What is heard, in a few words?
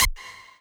lead